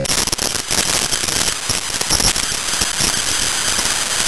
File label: pacman song; Scratch